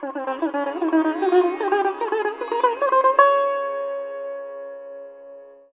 SITAR; Spectrasonics; Heart of Asia

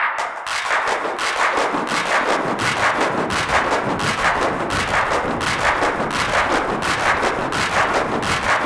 MEGACLAPS; Zero G; Malice In Wonderland

